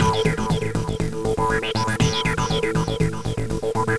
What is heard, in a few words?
Transformer Groove; Sonic Foundry; Crimson Blue and Fabulous Horncraft 4 RnB; Loop Samples